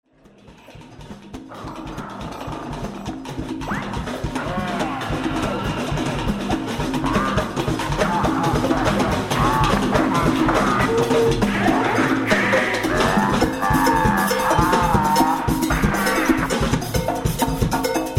percussion solos

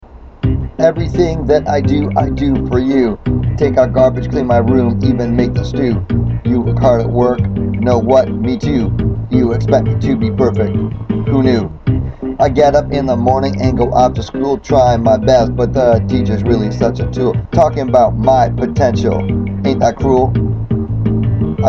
Rap; Peace Theatre

